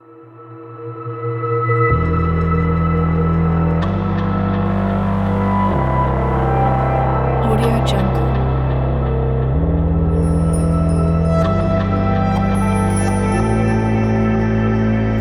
slow motion

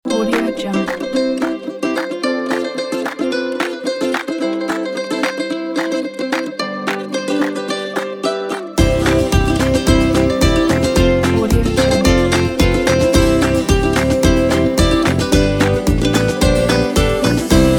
ukulele kit

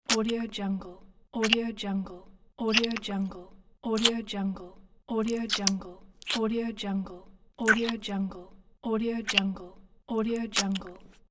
mouth french kiss

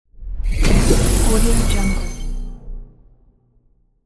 magic spell; infinity-sfx-productions

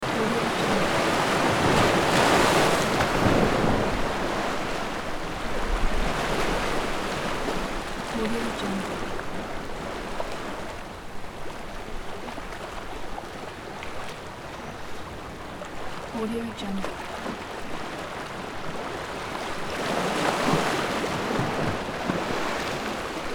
waves hit the beach

